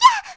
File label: Bump; Medli bumping into a wall; zelda sounds